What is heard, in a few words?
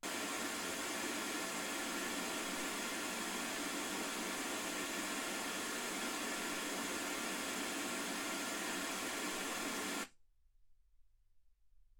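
white gaussian noise 48kHz; single mic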